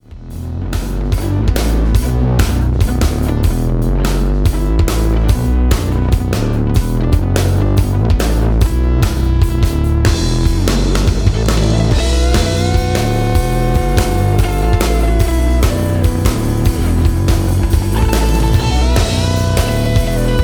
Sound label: Trippy Rock